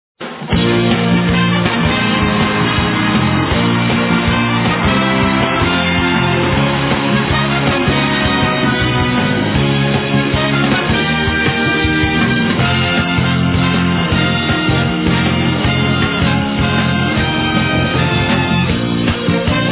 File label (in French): generique miss france